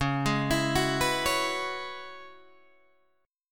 C sharp Minor 11th C sharp, Guitar Open G; C#m11 arpeggiated

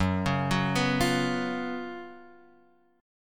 F sharp Major 7th Suspended 4th

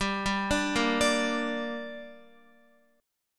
G Minor G down Guitar, chord; arpeggiated; JGuitar

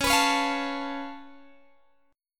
Db Augmented 7th Db down Guitar Open G

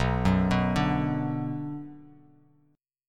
B Minor B, Bass Standard; arpeggiated; JGuitar

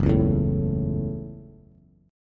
Gb Minor 7th Gb down Bass; strummed; JGuitar

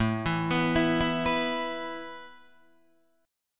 A Suspended 2nd A Guitar DADGAD

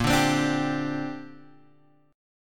Bb 6th Add 9th Bb Guitar Open G

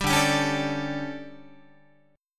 D Major 7th Suspended 4th, Guitar; DM7sus4; strummed; JGuitar

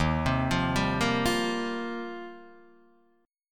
Eb 6th Add 9th, Guitar, Open D; 1,3,3,1,1,3

